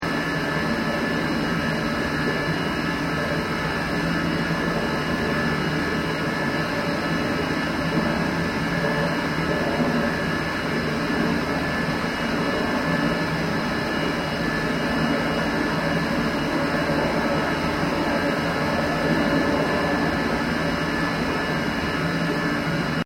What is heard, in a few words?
PLANT ROOM HOSPITAL EAST LOOP, Airconditioning plant machinery; Air Conditioner Sound Effects; Air Conditioners (Sound Effects:Household